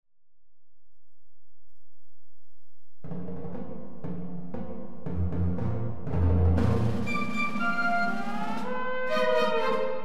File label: Fanfare; The Company of Biologists